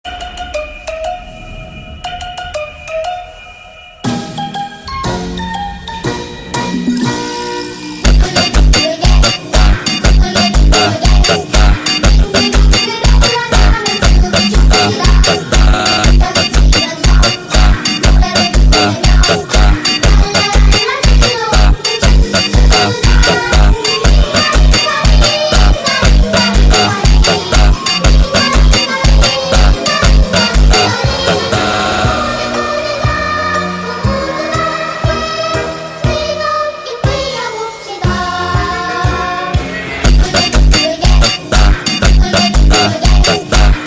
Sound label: funk Remix; Squid game